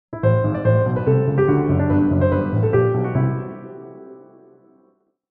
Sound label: 2 Layers Melody, Two distinct melodies in counterpoint; Rule of Three